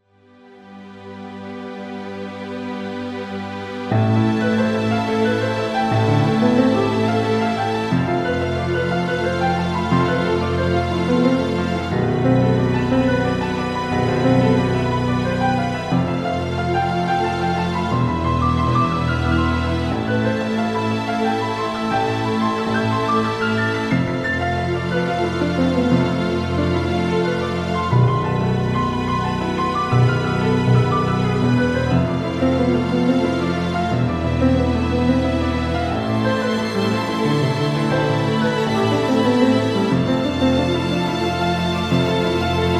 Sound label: instrumental